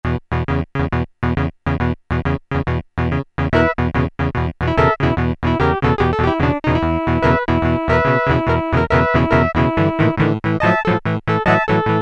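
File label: electric guitar, Casio MT-68; preset sounds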